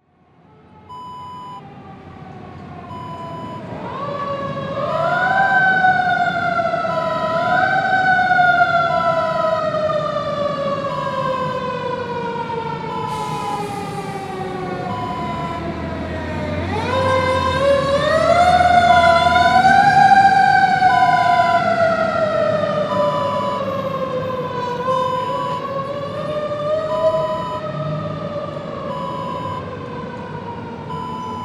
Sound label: fire truck